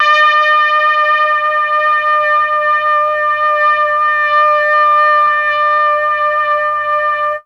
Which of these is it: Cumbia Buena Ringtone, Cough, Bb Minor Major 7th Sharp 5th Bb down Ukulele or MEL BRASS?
MEL BRASS